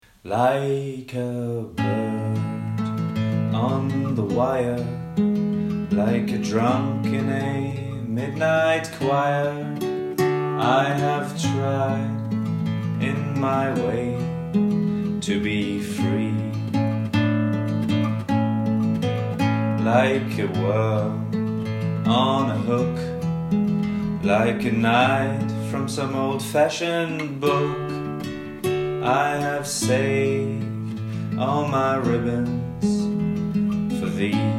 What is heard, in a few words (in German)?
Gesang; Stimmprobe; gekürzt